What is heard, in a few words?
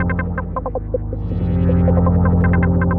ticker, Drum N Bass; Sonic Foundry (Sony Creative Software); Knutrix Drum & Bass